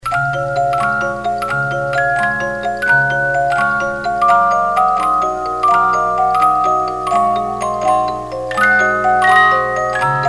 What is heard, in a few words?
Tales From The Vienna Woods, 50 Note music box